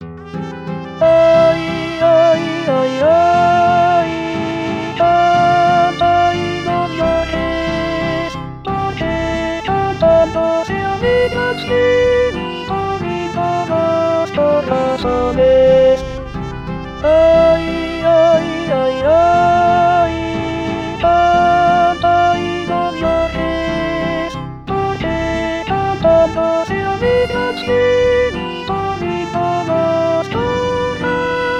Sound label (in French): cielito lindo alto